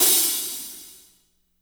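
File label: closed hi hat, BG HI-HATS; Spectrasonics; Burning Grooves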